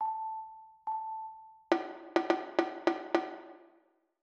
rhythm compound 2 beat

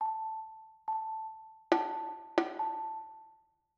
rhythm simple 1 beat

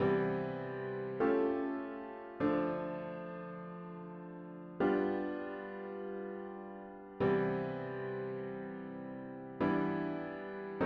chords only

